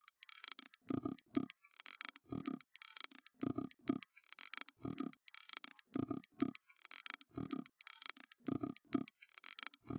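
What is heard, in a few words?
with drums inst src; SiSEC11; underdetermined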